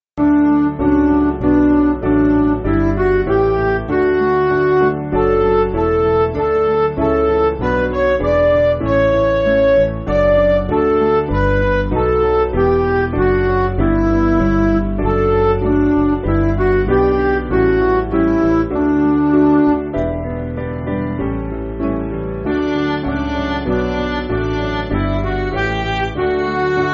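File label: Music Backing Files for Churches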